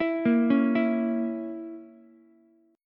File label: A sharp Diminished Ukulele, chord; arpeggiated; JGuitar